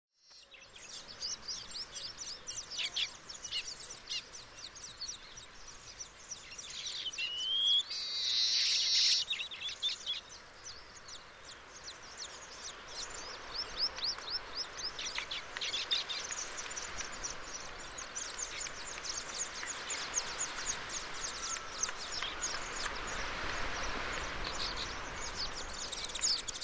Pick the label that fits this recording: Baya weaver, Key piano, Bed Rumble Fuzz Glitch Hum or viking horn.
Baya weaver